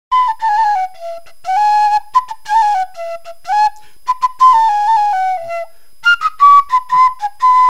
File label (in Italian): Flautino De Sassari; strumenti musicali antichi; Ottavini de Sassari; URM Sonos de Sardigna; Il laboratorio di strumenti sardi di Raimondo Usai